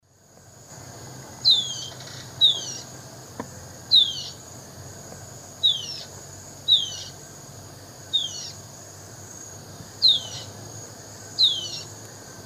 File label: Hylophylax naevius, varzea, distress calls; S. America; Eastern Lowlands